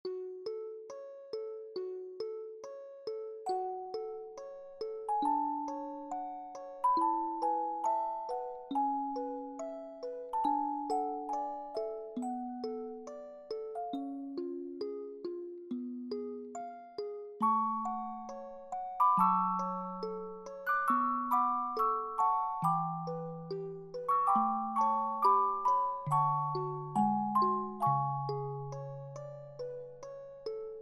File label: Pavane arr music box; faure